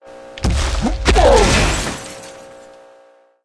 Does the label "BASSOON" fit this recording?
No